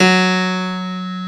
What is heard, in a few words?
PF F#1